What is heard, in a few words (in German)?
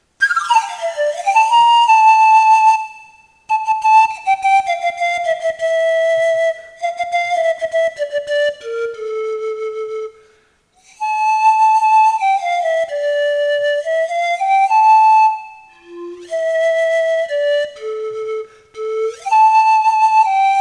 pan f dur